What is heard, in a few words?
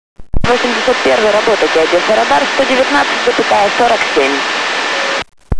radar; odessa